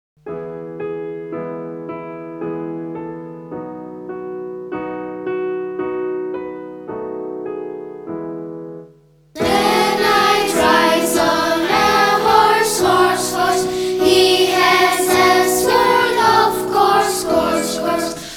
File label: Middle Ages Clapping Rhyme; englisch; schulstufe 4; kringlmingl englisch 4